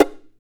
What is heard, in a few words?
CHARANGO FX